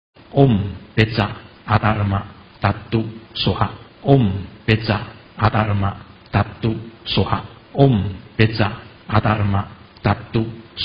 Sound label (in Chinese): 阿達爾瑪佛心咒 Adharma Buddha Mantra